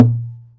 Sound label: Mute Guitar Bass Kick Hit Percussion